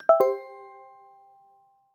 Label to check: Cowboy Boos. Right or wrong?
Wrong